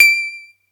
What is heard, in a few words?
atari 520st